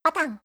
batan

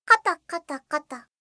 kotokotokoto

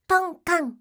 tonnkann

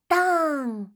doonn

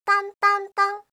tontonton

